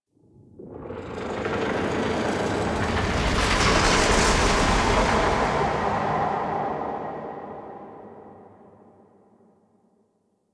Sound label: Pulsating Whoosh; Ambience